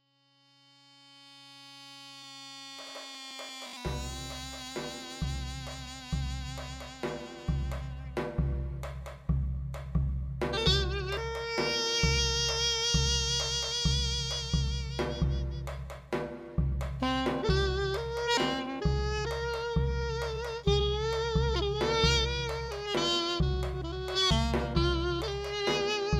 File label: piri & janggu/jing